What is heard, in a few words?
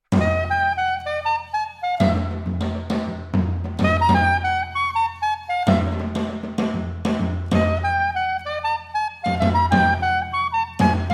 suite for saxophone and percussion presto, saxophone, percussion